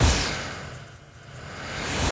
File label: soul totem slash